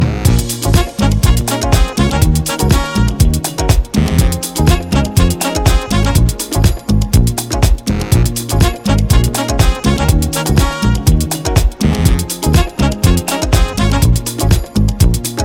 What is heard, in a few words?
Original Instrumental